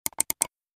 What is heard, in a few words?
Double click computer mouse